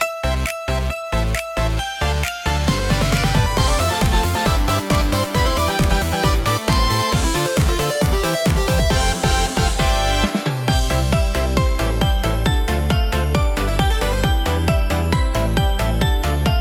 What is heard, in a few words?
Holiday Hype Bells, christmas bgm